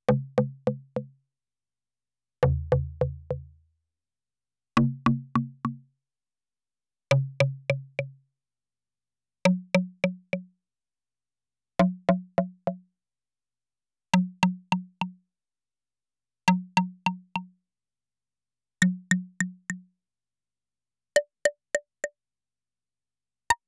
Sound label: Fire Instruments RIM